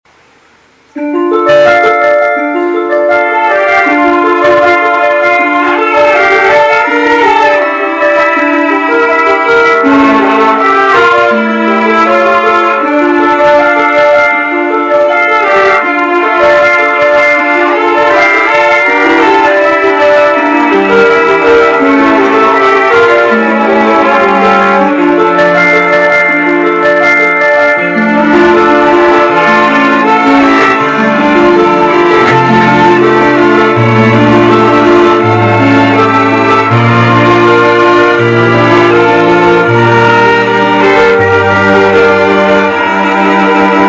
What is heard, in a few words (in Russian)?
skripki; Молод конф Краснод край